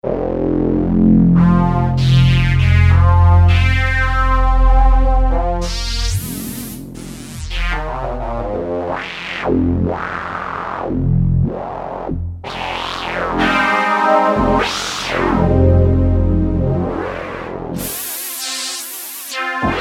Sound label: Rezo BPF Sweep, Roland JD-990; Vintage Board